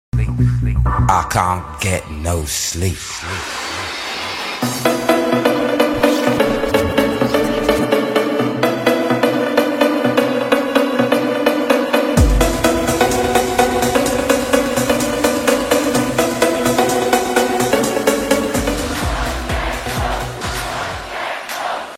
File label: Instrumental